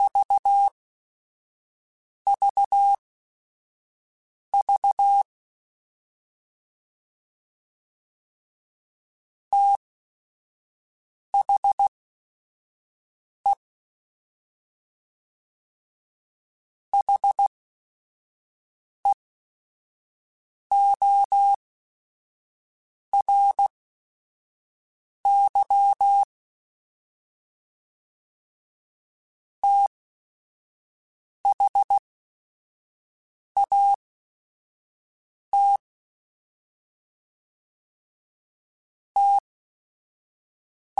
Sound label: TH Runs, Morse Code; CW Code Groups; Mixed; THs; HamRadio; Prefixes